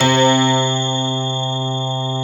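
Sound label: grand piano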